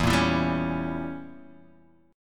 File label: E Diminished 7th E down Guitar Open D